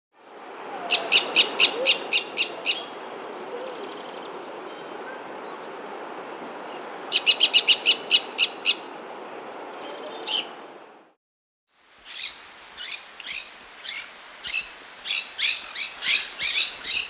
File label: Green-Cheeked Parakeet (Pyrrhura Molinae); S. America; Andean Birds; Natures Songs